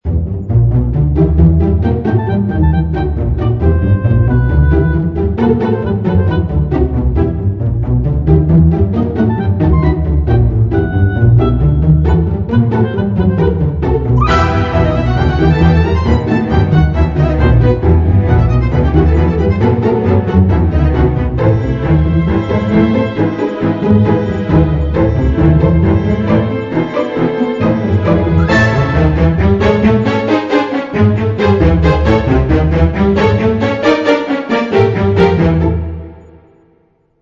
music; toy 3; toys daycare dash

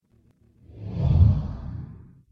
splash transition; Cars

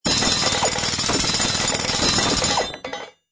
Break; disney; big crane game

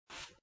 generic card scroll; toystory; toystorymania

